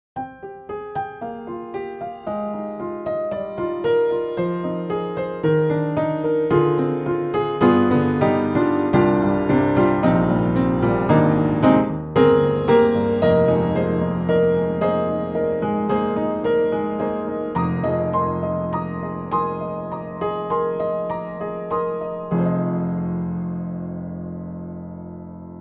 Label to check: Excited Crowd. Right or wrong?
Wrong